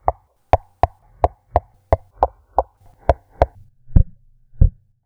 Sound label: small glass compare, Cup drum; russia 2011